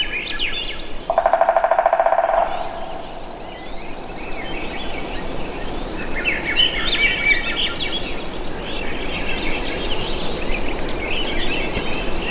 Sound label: American Three-Toed Woodpecker Drumming With Swainson's Thrush; alaska acoustic highlights; BIRDS